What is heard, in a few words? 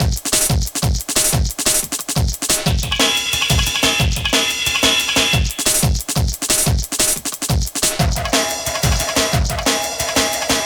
Victory Beat, Drum & Bass; Fluid Dynamics